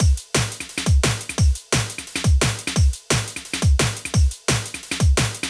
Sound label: DnB Slammer 174bpm